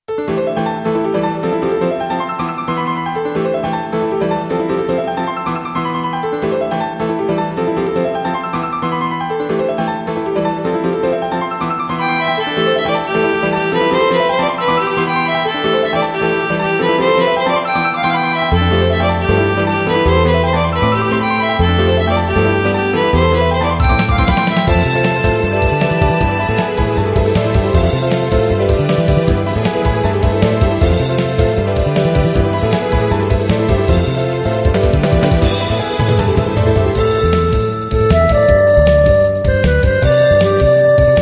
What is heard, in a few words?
bgm battle; fantasia